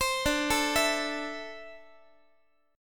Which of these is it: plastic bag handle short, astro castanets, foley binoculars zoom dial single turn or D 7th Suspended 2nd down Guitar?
D 7th Suspended 2nd down Guitar